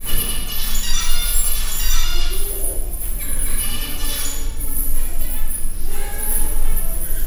fent a magasban léghuzatos felvétel, zebrapinty; Győri Zoo 2014

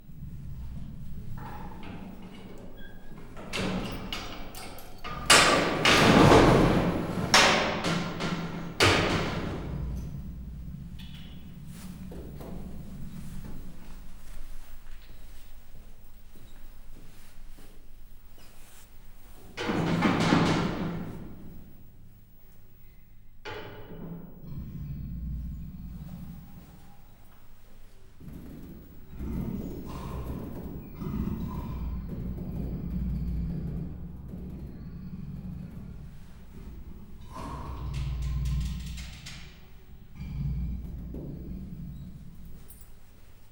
rácsozat és halk morgás, perzsa leopárd; miskolci zoo 2018

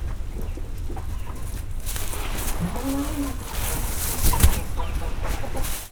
szalmaban egyet ugrik, hazinyul; állathangok; paraszt udvar

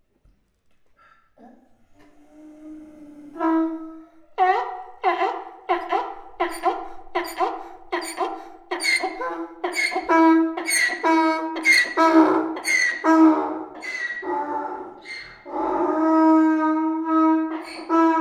szamárbőgés, magyar parlagi szamár; miskolci zoo 2009

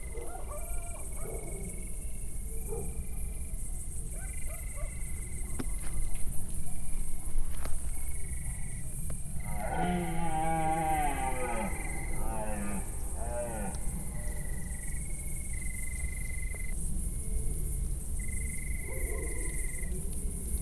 vadász tülökkel tücsök, gímszarvas; termeszetben

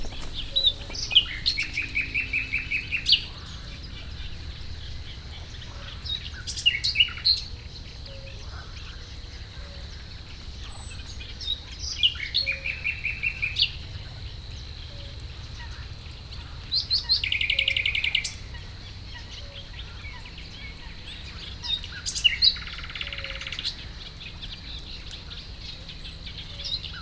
madárszóló békakórussal, hortobágyi halastavak

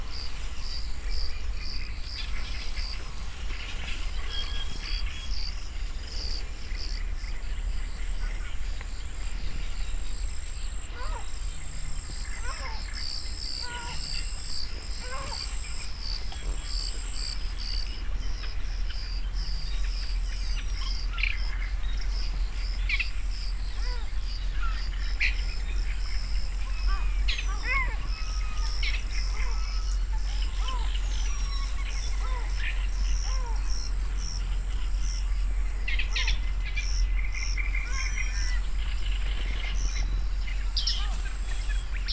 hangos madárral fejeződik be, halastavak; hortobágyi nemzeti park 2013

